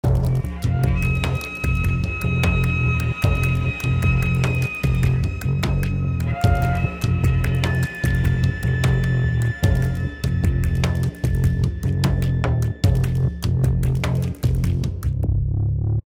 Battle Loop; Infinity Blade 2

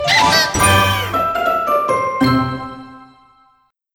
Crunchy Finish

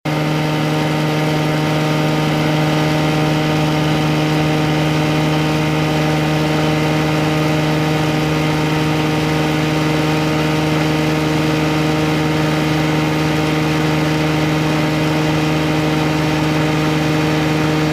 MOTOR

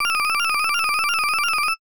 SFX Hurry

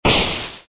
splash; Robot Wants Fishy

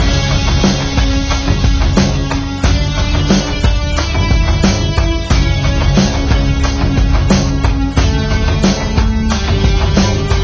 Tension Loop

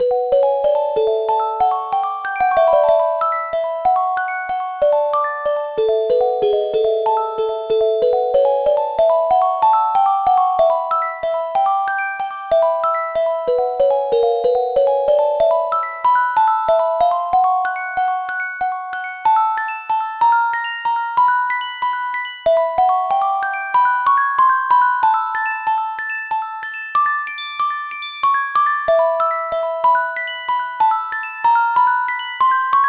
Underwater; New Super Mario Bros. 2; Gold Ring layer

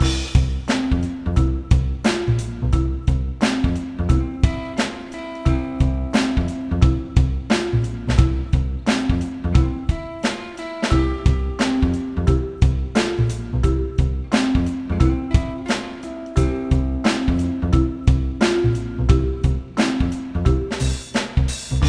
Game Music; USA Today Puzzle Craze; NDS gamerip

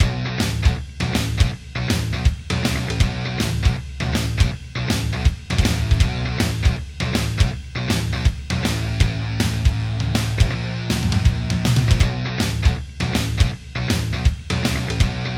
3d Music

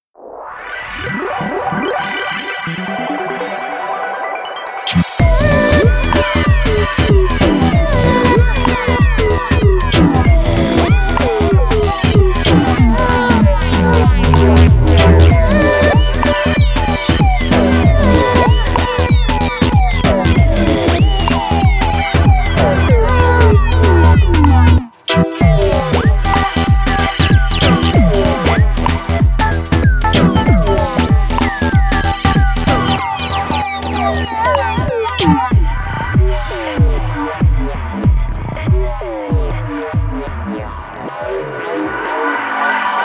Forest Dub; sayonara wild hearts

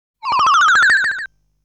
Special Item Acquired Sound

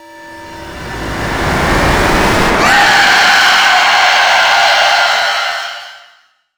Jump Scare Stinger; Spookware

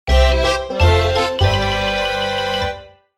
Game Begin; Chicken Coup

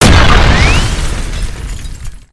Explosions Destruction of War Machines

